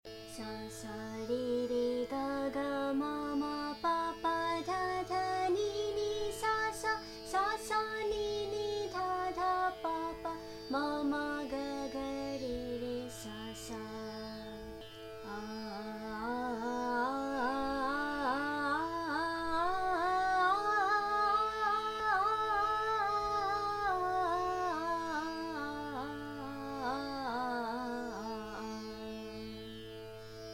Sargam Double, raag hindustani

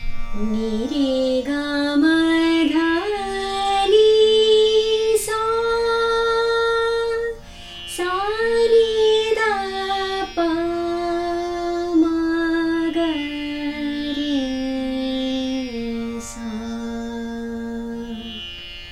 Yaman Aroh, raag hindustani

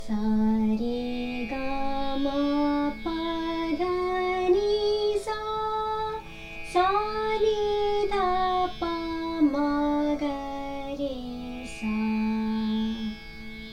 Shuddh Swar, raag hindustani

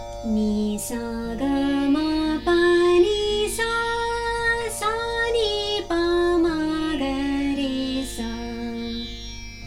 Dhani Aroh, raag hindustani

